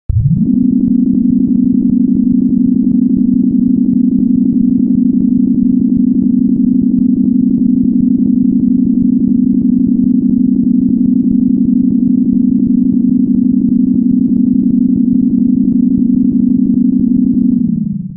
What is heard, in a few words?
elevator; undertale